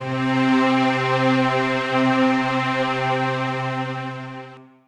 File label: INSTR Hollywood (C); Melodic One Shots; Cymatics; ANALOG EVOLUTION Beta